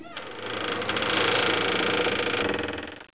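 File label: door creak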